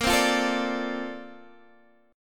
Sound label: D-Minor 7th Bb, chord